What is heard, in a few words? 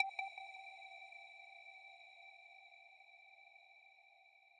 arp